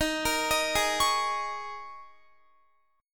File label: D sharp 7th Suspended 4th, Guitar; D#7sus4; arpeggiated